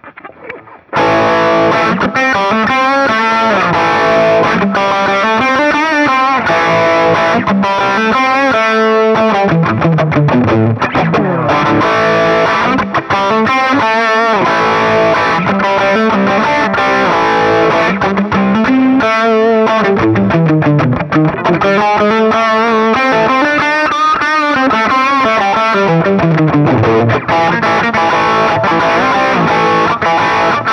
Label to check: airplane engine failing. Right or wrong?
Wrong